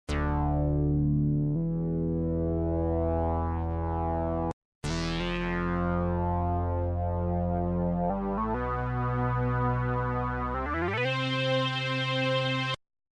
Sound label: Fat Triangle, Nord